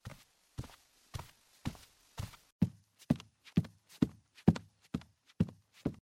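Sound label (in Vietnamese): tiếng bước chân đi từ 2 môi trường khác nhau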